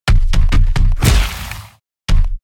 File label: attack; hero